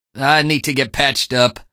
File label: wounded; npc; Garry's Mod; hl2; Barney